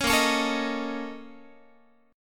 A sharp Minor Major 7th Double Flat 5th, Guitar; A#mM7bb5 strummed; JGuitar

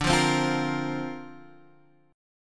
D-Minor 7th Sharp 5th D Guitar Standard, chord; Dm7#5; strummed

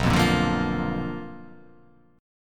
B Minor Major 7th Flat 5th, chord

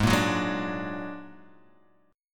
A sharp Augmented 7th G sharp, guitar

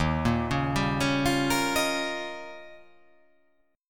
D sharp 9th D sharp

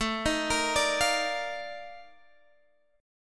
F 13th A, chord

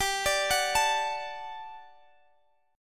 G Major 7th Suspended 2nd G

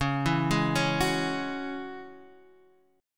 E 6th Add 9th; chord shape

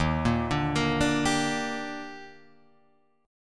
Eb Major 7th, Guitar Drop D

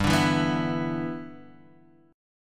G 6th, Guitar, Standard; G6 strummed

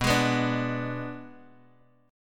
B Minor 6th B, Guitar Open G

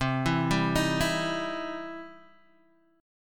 E Minor Major 7th Sharp 5th C

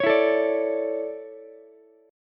D Major 7th Suspended 2nd, Ukulele; DM7sus2; strummed; JGuitar

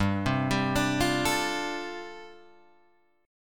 G 7th Suspended 2nd Suspended 4th, Guitar Open G

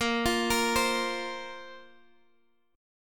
A sharp Suspended 2nd A sharp down Guitar Open G

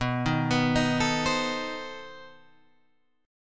B 7th Flat 9th B Guitar Open G

